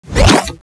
glove launch; cstrike; next21 kart

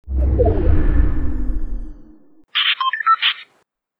redzone forming; cstrike; pubg

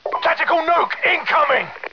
nuke friendly; codmw2; ks earn

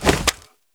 rpg ready; cstrike; pubg

